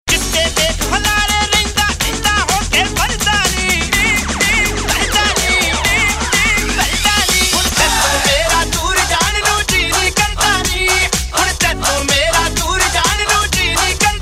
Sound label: Arabic